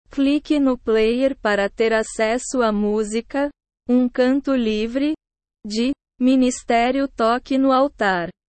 um canto livre

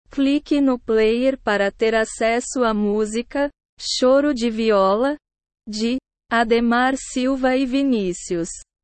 choro de viola

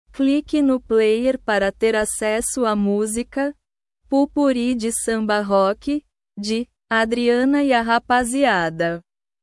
pout-pourri de samba rock

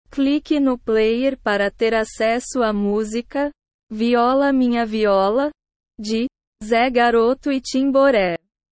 viola

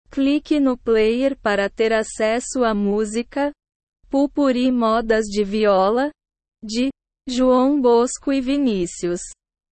pout-pourri modas de viola

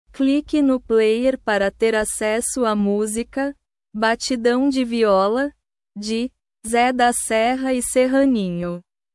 batidao de viola

